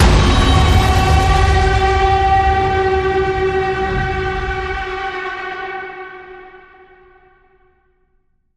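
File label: hit horror; Krusher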